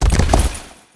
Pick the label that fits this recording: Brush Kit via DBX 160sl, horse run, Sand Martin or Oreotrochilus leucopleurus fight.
horse run